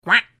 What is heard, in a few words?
quack